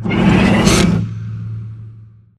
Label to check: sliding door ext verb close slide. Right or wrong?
Right